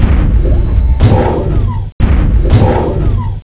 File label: secret close; dm throwback